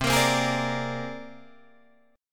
C 7th Suspended 2nd Sharp 5th C Guitar Drop D

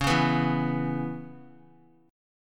C sharp Minor 7th, Guitar; strummed; C#m7; x,4,1,4,4,x

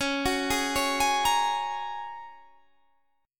A sharp Minor 7th Sharp 5th C sharp

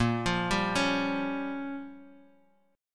G Minor 7th Flat 5th A sharp

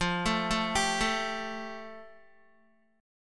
F Major Add 9th, Guitar; arpeggiated

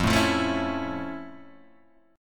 F Major 7th Flat 6th, F, guitar

